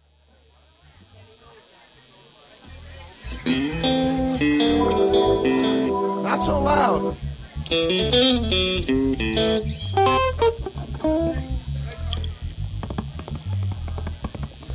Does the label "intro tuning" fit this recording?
Yes